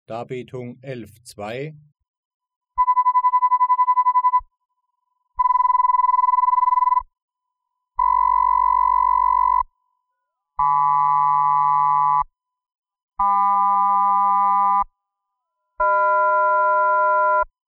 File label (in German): Rauhigkeit, Modulationsfrequenz; Die Empfindung Rauhigkei